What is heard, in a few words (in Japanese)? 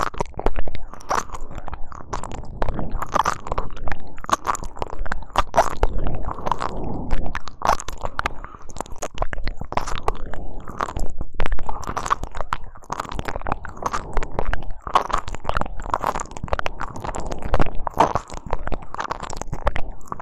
耳舐め くちゅレロ奥までねっとり耳舐め; くちゅレロ奥までねっとり耳舐め＆オナニー